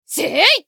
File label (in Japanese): 攻撃; 迅雷のアドリアナ